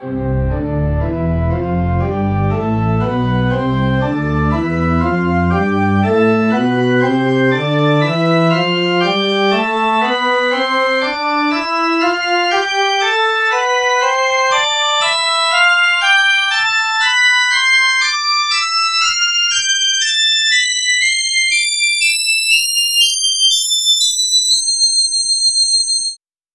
church organ tonleiter

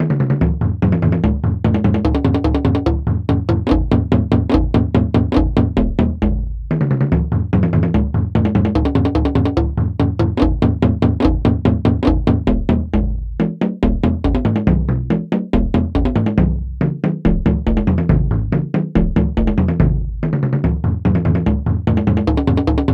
taiko drum

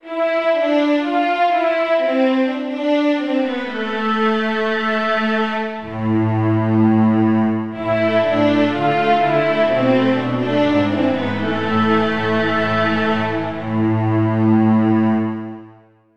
slow strings

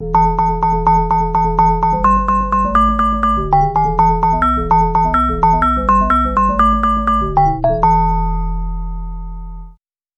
music box bass samples

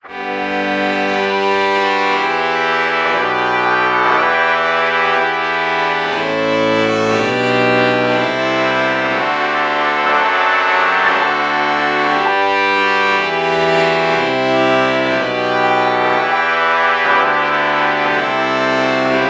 trumpet violin lpc true bach morph

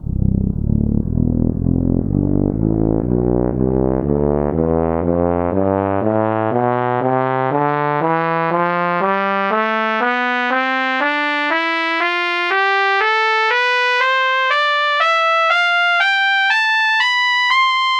trumpet tonleiter

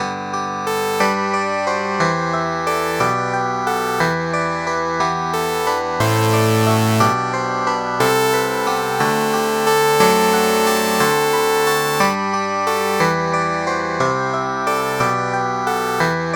charang

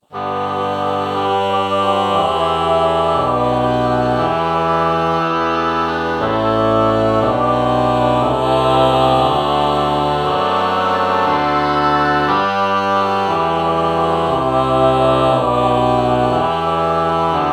ah oboe morph

